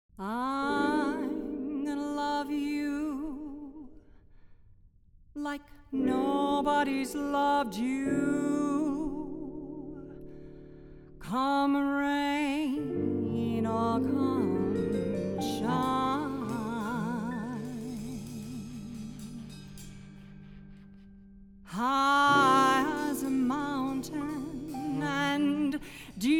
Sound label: jazz ensemble